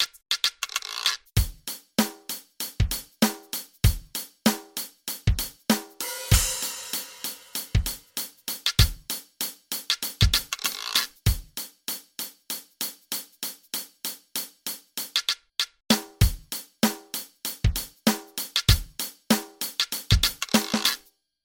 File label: Electric Snare